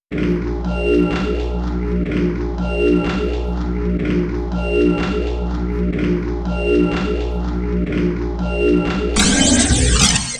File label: Boot System; sanvein originalsoundtrack 2020